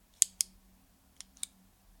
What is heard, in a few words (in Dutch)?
geluid pennenkliks